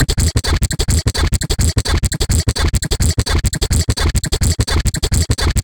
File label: glitch loop for artcore